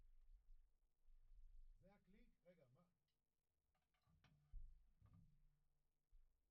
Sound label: Kick In